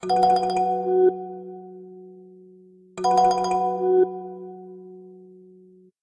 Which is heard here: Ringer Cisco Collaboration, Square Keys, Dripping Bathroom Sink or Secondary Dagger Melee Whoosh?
Ringer Cisco Collaboration